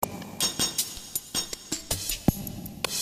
2 Step

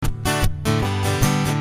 Strummed D,G 152

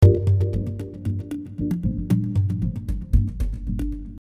Mallimban Gm7 115

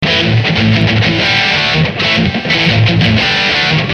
rhythm 124

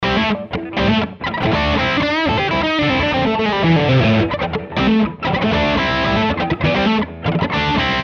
120 Funky Rhythm Rock Solid A

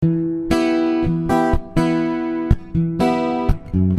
Punchy Em 122

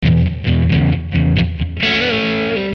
90 rhythm blues

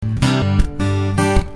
Punchy C,G

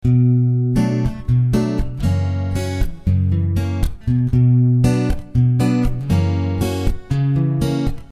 Punchy Bm, Gb7, Bm, Gb7 60

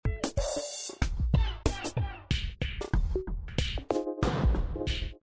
Funky F7 93; Funky Hopper

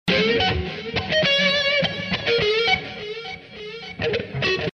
Gb 104 rhythm

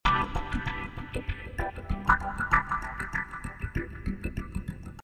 Swinglefunk C

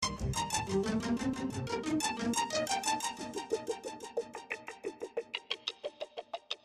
Gtr & Pizz Echoes 90 Cm7

